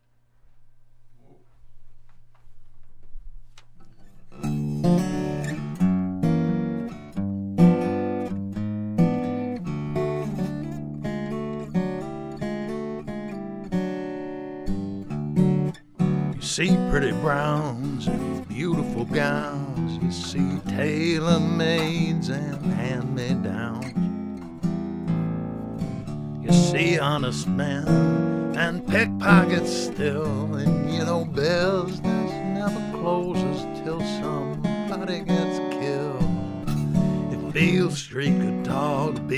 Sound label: Altec 9470A, this was recorded with two Neumann KM84 mics; one at 12th fret; one 3″ from lower bout and a Neumann U87 on vocal.